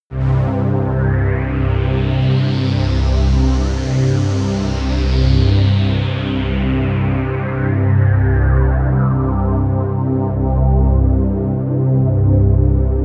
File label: pad sweep normal; flaechen